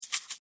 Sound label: Food Seasoning Bottle Shake Short; Food Sound Effects; Food (Sound Effects:Household); SFX Bible 6